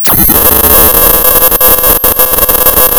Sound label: pop, tearing paper